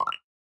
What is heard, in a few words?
click